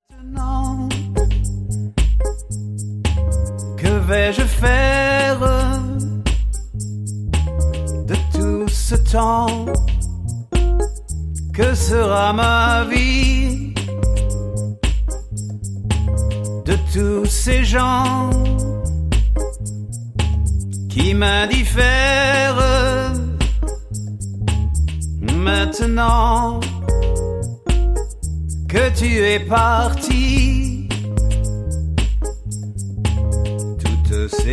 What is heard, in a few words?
French Singer